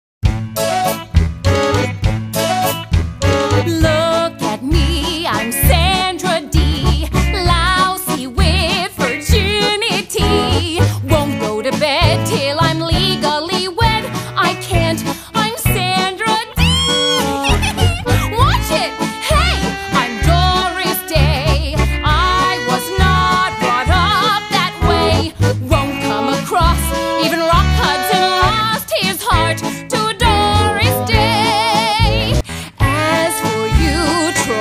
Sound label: guide vocal